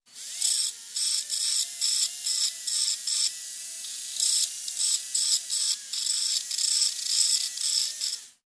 Dentist Drill, Drilling Tooth, High Pitched, Various Short Bursts.